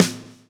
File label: lash, backbeat